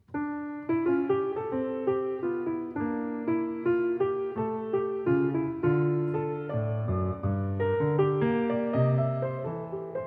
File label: Short Impro